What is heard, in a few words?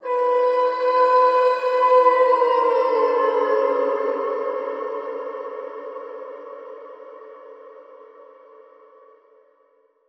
Vocal Fury Siren Bend